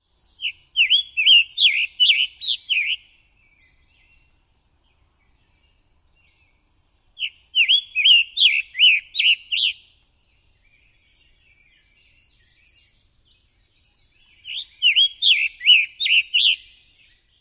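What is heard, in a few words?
SCATAN song num 1, scarlet tanager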